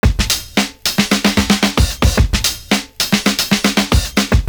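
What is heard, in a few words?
NO effects FILL, LOOP; Univers Sons - Kitch 70s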